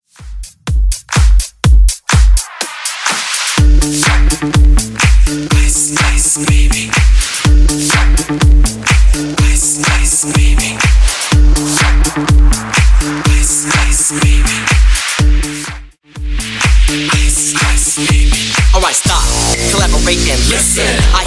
House Clubing 124BPM

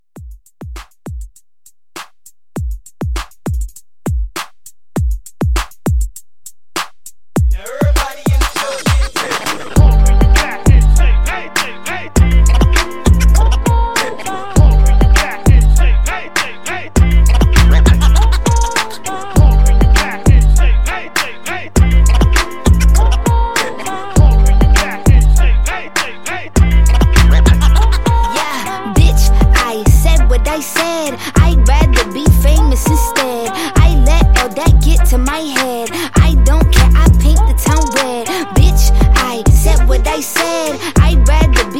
Hype Scratch Re Drum 100

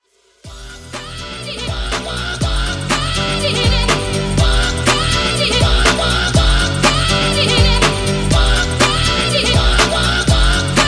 Transition 120 122 Bpm

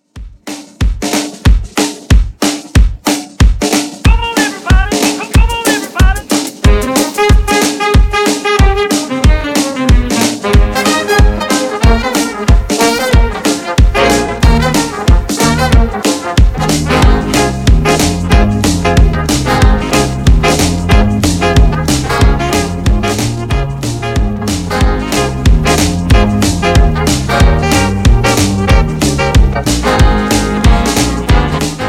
swing; Redrum

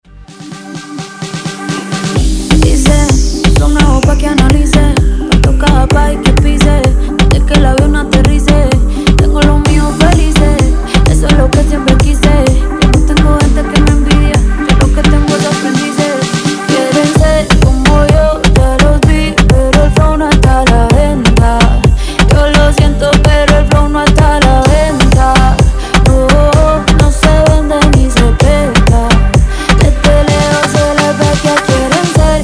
Latin House Redrum

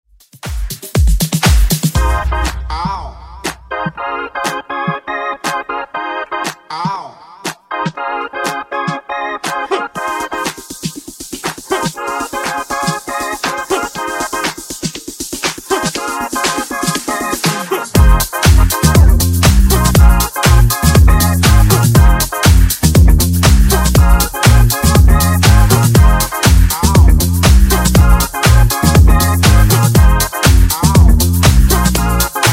Funk Redrum 120 Bpm

